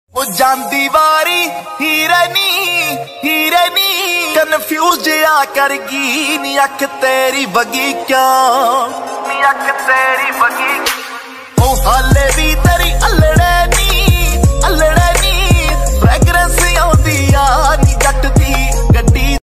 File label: Desi Punjabi Ringtone